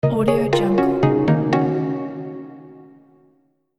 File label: asian melodic notification